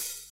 clean hi hat one shot B key, hi hat one shot tuned to the B note; hat samples